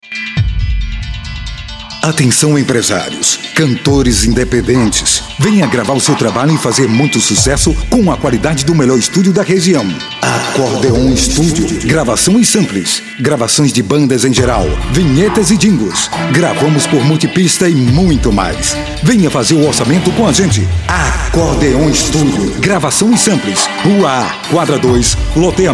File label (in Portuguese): vieta acordeon